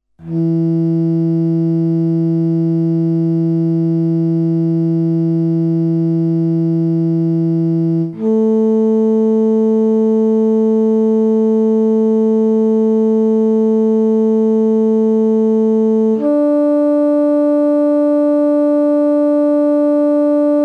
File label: tuning guide, bass; guitar-bass